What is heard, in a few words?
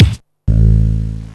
drum & bass; Trackers; Modland